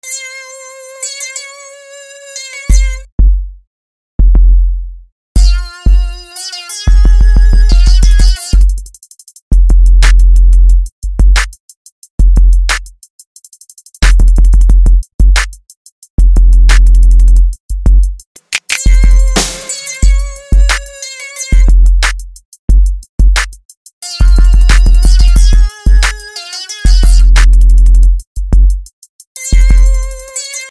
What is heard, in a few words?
Beat, Dance/Club; HomeGrown Hits